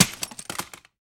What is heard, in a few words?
Drop Rifle; Prison Architect